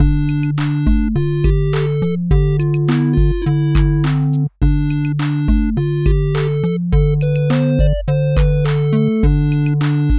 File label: sink Beats